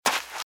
Footsteps - Sand - Dry - Run - Right; RadwinIsland

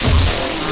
Scifi Power Up; Energy & Forcefields

